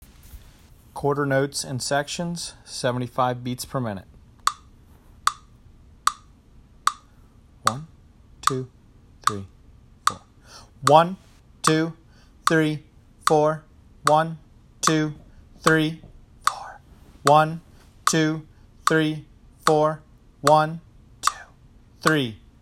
Quarter Notes Sections 75bpm; Drum Lessons

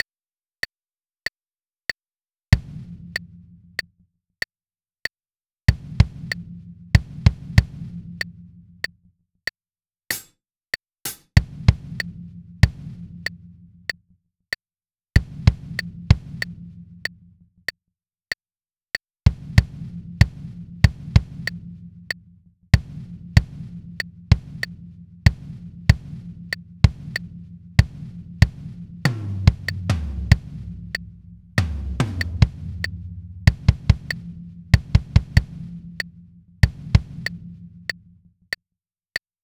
95BPM; Drum Lessons

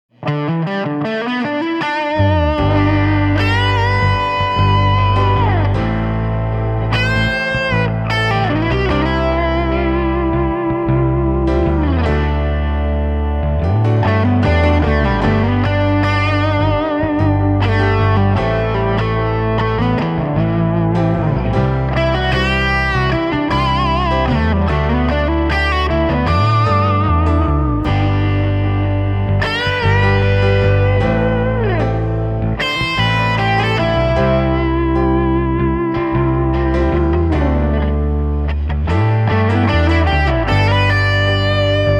roots rock without drums; Drum Lessons

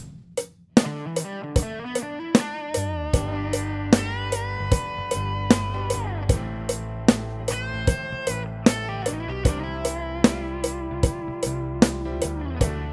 Roots Rock 76 BPM; Drum Lessons

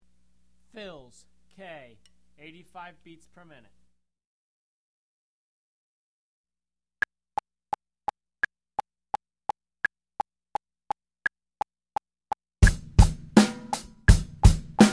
fills 85 BPM, Beginner Fills; Drum Lessons

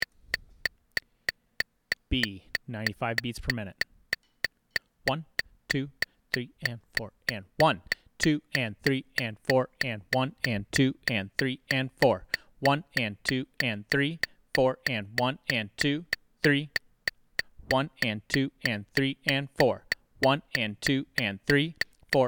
Drum Audition 95BPM; Drum Lessons

